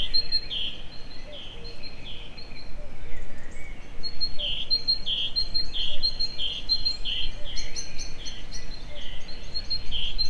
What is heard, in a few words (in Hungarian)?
reggel nyitnyikek, széncinege; egerturistahaz; allathangok; termeszetben